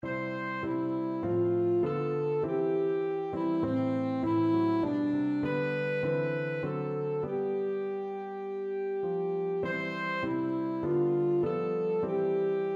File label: ASAX, saxophone